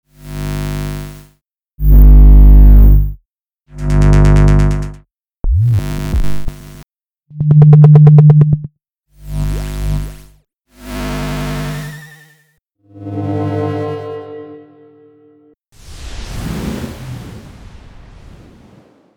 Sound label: coil pickup